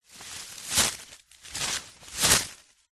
magic plant vines leaves movement wrap around grab reversed